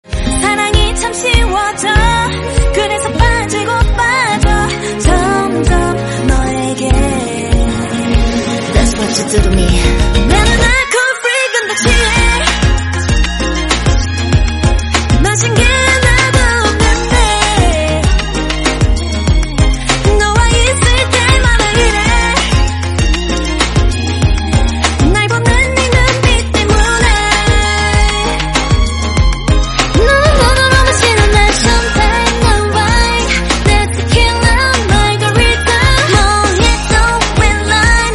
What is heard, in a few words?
Korean Song